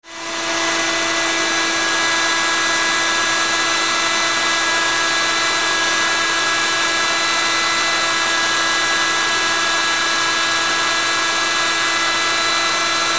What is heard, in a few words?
hair dryer